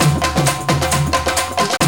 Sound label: DHOLAK; AMG - Now CD-ROM (Roland)